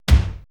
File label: 80S DRUM SET